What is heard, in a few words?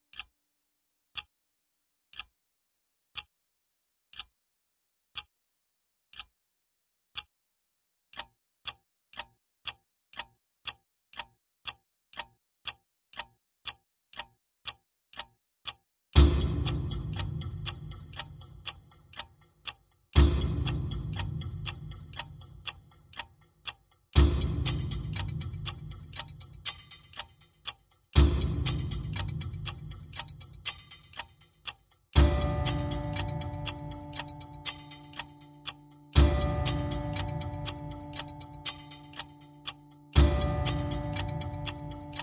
Tick Tock